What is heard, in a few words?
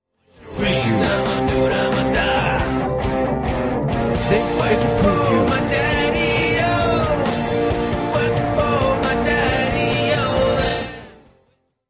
soundalike whiskey in the jar chorus part; Arphiola ringtones; Soundalikes and covers